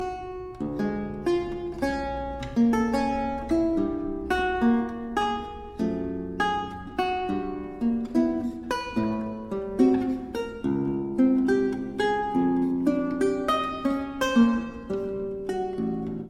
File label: lute suite basse danse